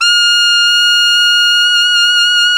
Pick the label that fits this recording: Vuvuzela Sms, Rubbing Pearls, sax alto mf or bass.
sax alto mf